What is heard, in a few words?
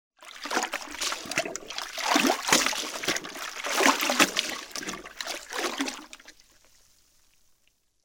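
mop lowered into bucket and raised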